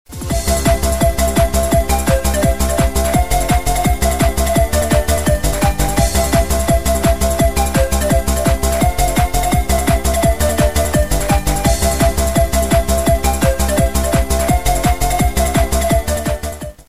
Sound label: jingle bells beat; newyear